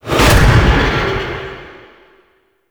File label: Undead Impact; Warframe; Dante